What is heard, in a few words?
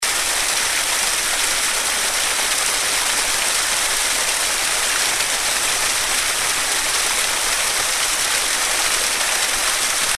Falls; Newington Falls